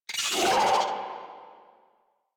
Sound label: Infested Charger Alert, Enemies; Warframe